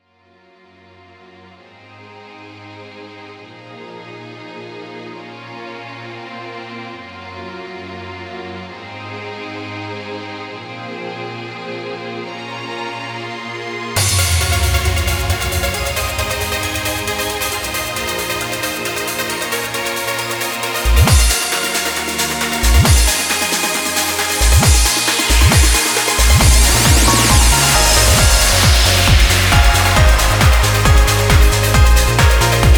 without vocal; i.o.sound

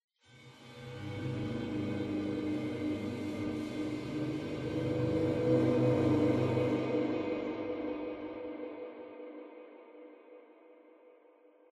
Cymbal

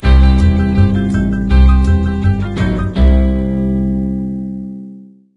Music Intro; Blimp Music; Diner Dash Flo on the Go; NDS

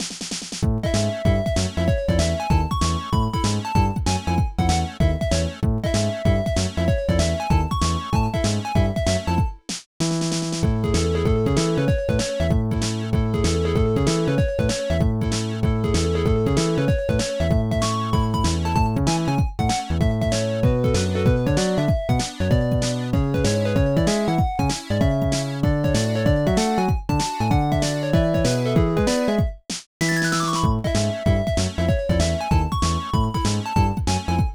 BGM Menu; Ant Nation; NDS

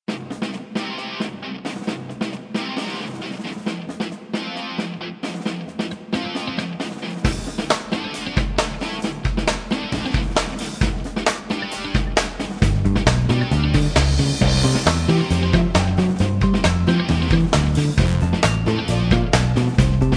battle loop snow; guns n glory wwii android game music

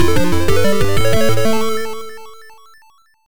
Level Complete; Turrican 2; Atari ST

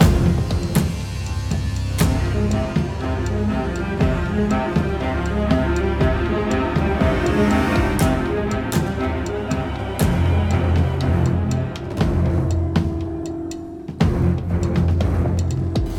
String; Assassin's Creed Unity Dead Kings

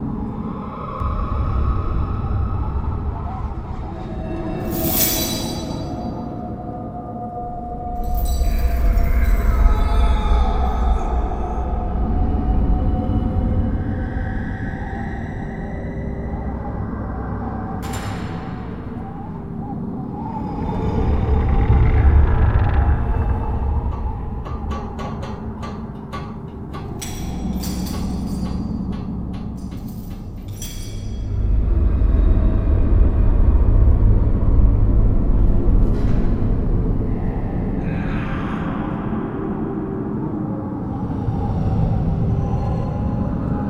Prison ambience; Shrek 2; GC PS2 Windows Xbox

